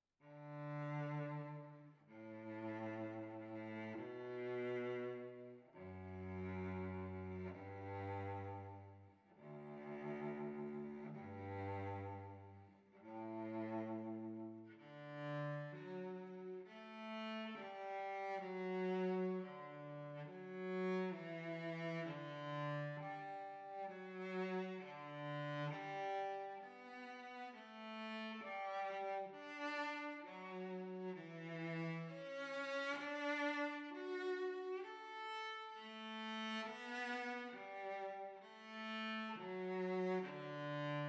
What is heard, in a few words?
pachelbel canon